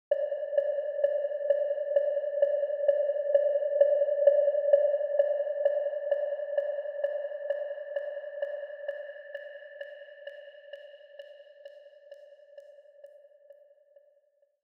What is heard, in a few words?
Virus Reverb